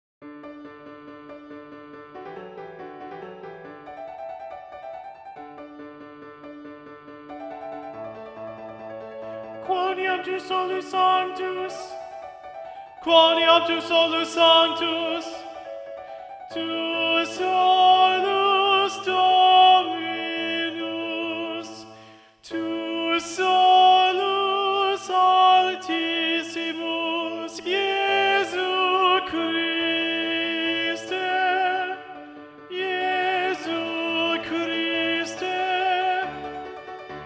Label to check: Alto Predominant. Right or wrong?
Right